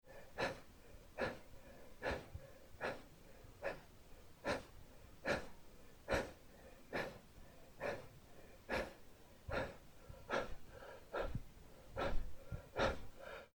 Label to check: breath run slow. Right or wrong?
Right